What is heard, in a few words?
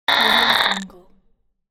emergency message incoming